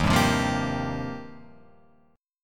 D sharp 6th Add 9th, D sharp, down, Guitar, Open F; D#6add9 strummed